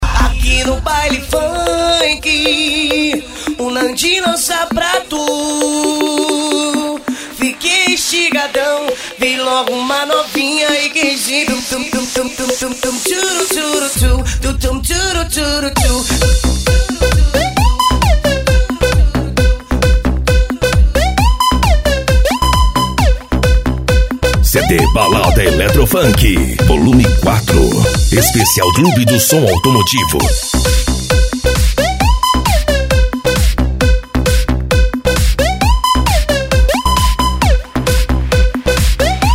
eletro funk; cd balada eletro funk vol4

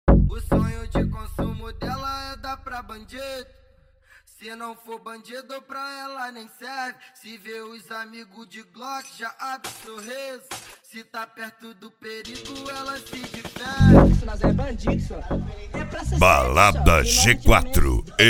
mega funk

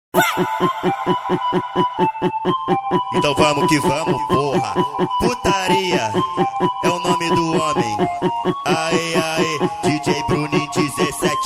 mega da flauta; equipadora junior som vol 02

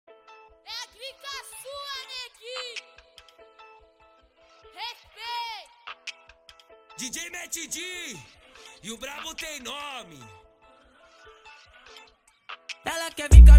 graves bass

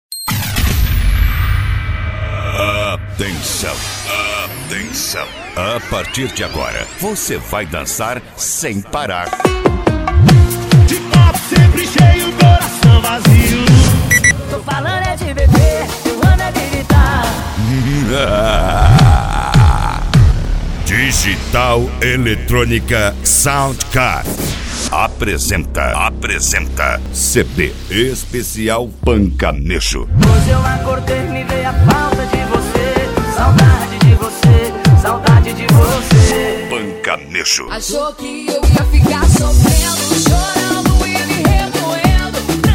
pancanejo eletronica sound car; cd pancanejo digital

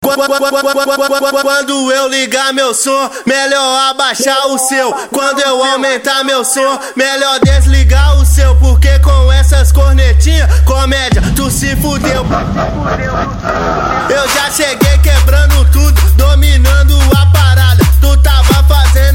racha eletronica e funk mega bass

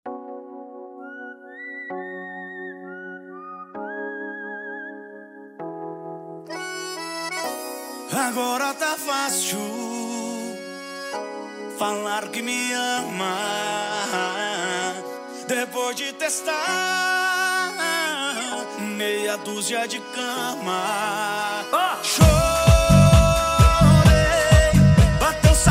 funk tumdum e mega funk

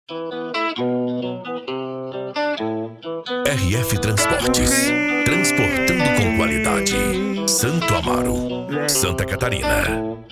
especial funk, cd

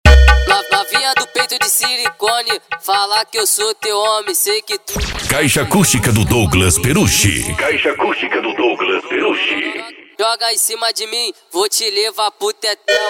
especial funk mega bass

